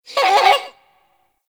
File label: Forest Creature Mid Medium; Warframe; Grineer